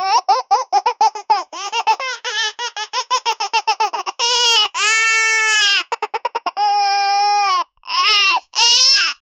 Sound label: two babys laughing